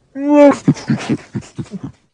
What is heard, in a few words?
laugh chuff